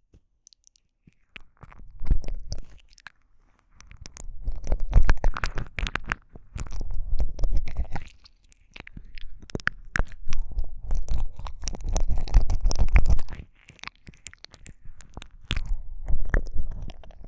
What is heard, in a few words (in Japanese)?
耳舐め, ASMR